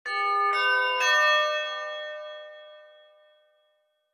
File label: School Chimes